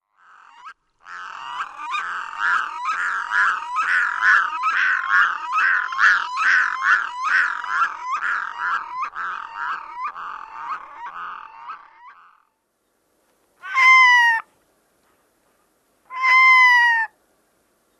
Red throated Loon

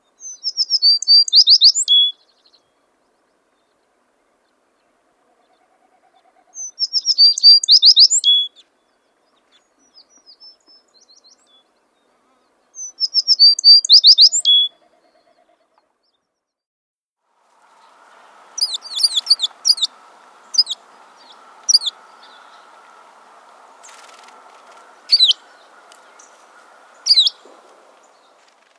American Tree Sparrow

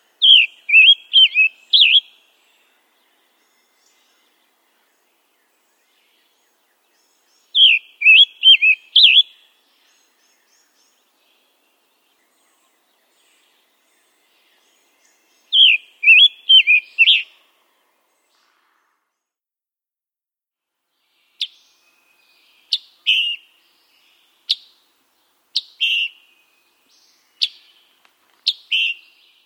Scarlet Tanager

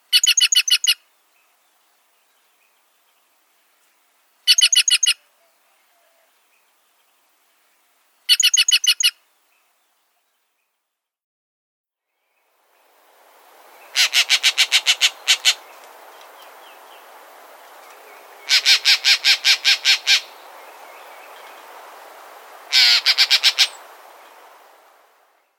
Green Jay

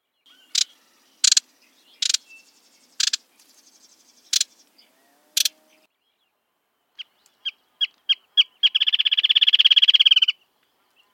Wrentit

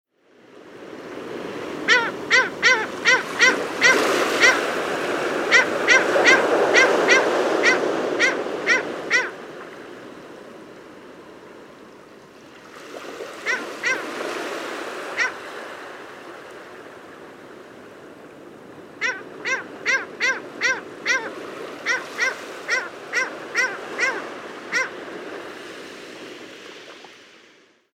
Heermanns Gull

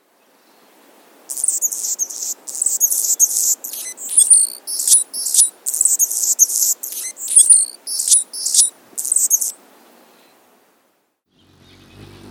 Annas Hummingbird

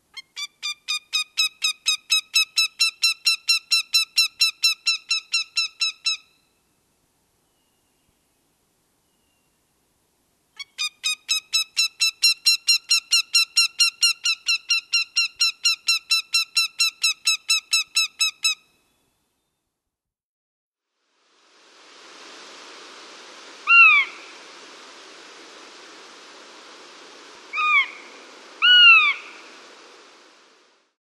Northern Goshawk

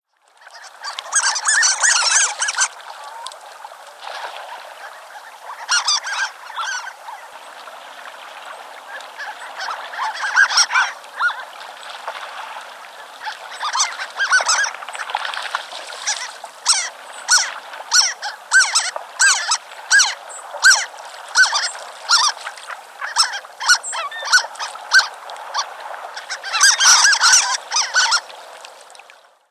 Harlequin Duck

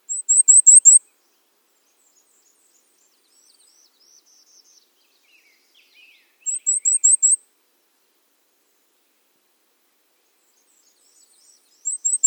Cape May Warbler

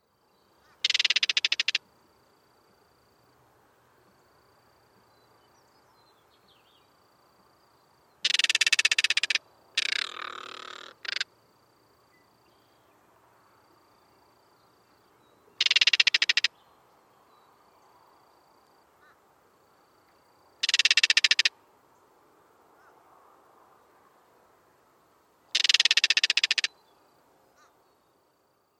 Crested Caracara

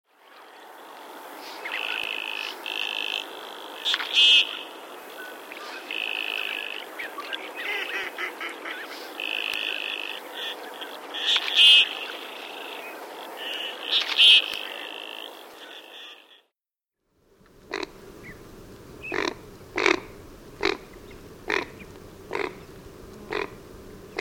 Common Goldeneye

